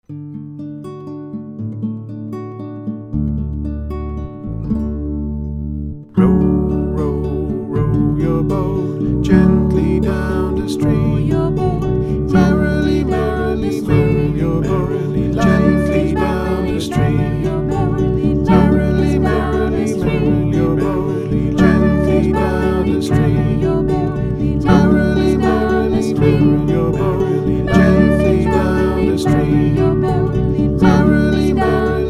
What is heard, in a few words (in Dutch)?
met zang majeur